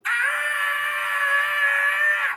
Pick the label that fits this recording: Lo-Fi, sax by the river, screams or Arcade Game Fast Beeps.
screams